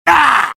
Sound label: sniper pain severe; svencoop addon; tfc; player